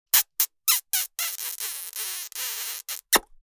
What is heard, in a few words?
Woman kissing long suction and smack